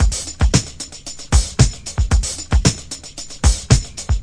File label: classic break, drum loops and breaks